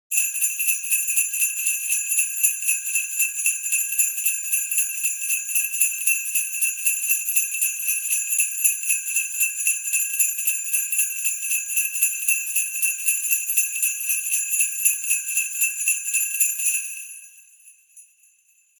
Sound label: sleigh bells ringtone